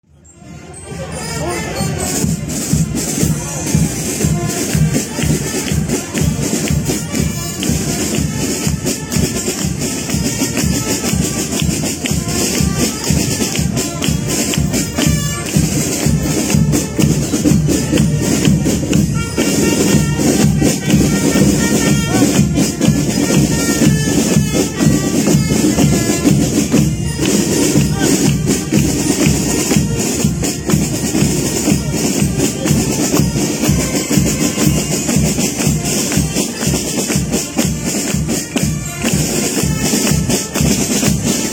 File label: Polka; Bucheron